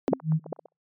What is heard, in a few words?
ui scifi bubble approve